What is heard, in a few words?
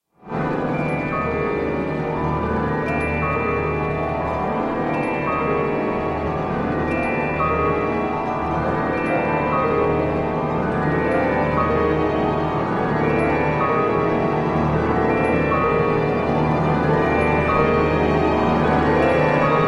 music for strings, percussion